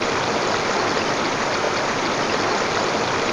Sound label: waterfall 8Bit; abbottabad compound